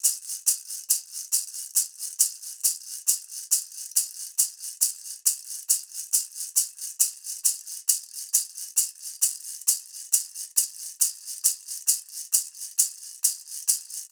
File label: Maracas; Nightsong (Hobeea)